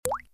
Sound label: water drops single